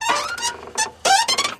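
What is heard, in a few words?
Oldsmobile Trunk Squeak; trunks & hoods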